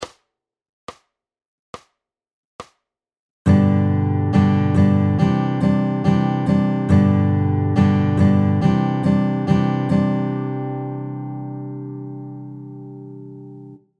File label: varying strum patterns ballad extra eighth note